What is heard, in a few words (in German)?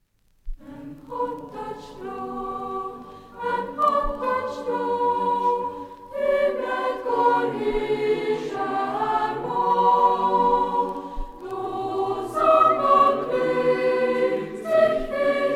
umgangssaechsisch, cantores juvenes; Äm Honterstreoch; Brukenthalgymnasium; Hegt wird gesangen